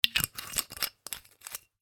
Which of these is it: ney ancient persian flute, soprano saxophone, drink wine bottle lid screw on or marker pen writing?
drink wine bottle lid screw on